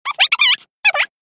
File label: clock